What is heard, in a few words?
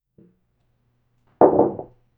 put glass on table